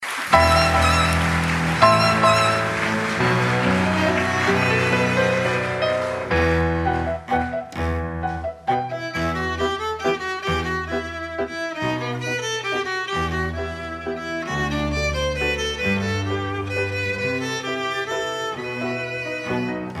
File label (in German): walzer; demaskierungen; Walzer Mortale